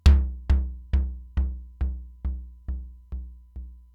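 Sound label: Simmons SDS-9 low tom, Drum Machines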